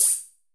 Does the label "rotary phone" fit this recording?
No